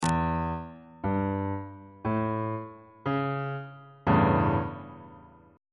g double sharp dominant 7th 2nd inversion on bass clef